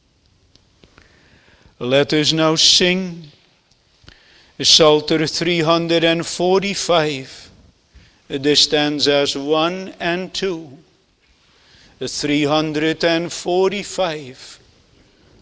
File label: Singing; True Blessedness